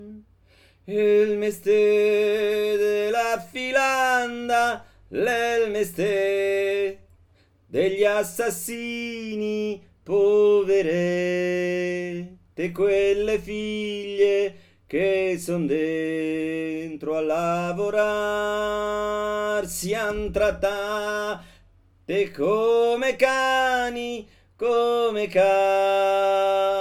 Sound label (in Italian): mamma mia mi sun stufa